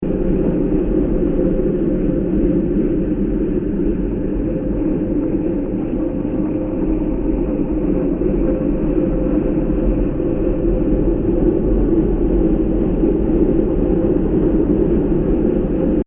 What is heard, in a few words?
Noisy Space Ambience 120bpm; Sound Design